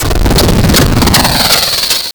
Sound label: Launch Transmission; FLEETCOM; bfxr